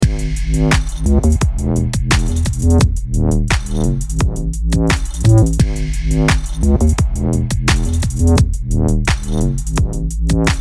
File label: Jazz Dubstep